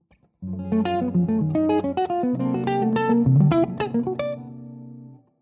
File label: Lydian Dominant Lines